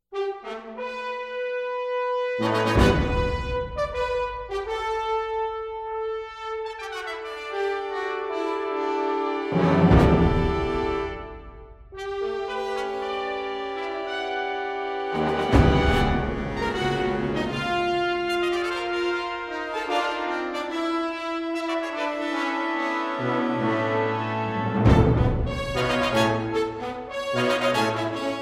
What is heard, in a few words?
Fanfare, Brass